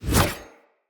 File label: Sword Whoosh Heavy; Fey Sword